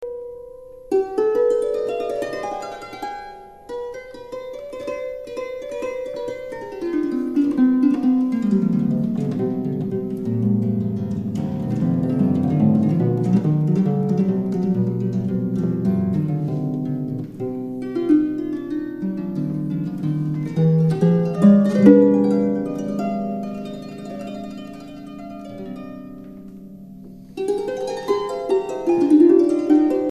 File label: toccata